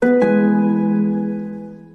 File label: Roll-Royce Chime